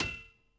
hand grab hollow metal

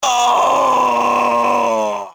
writhe, pain death, male

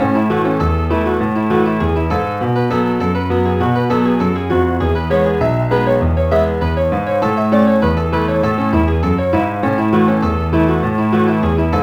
Piano; Scooby Doo Mystery Mayhem; XBOX

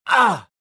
pain; Star Wars Jedi Knight II Jedi Outcast; XBOX; bespincop

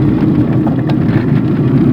roll metal smooth, terrains; Tony Hawk's Project 8; XBOX

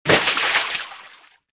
bodyfall water; player

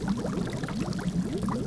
boiling, saber; weapons

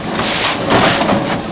machine; WhiteOut

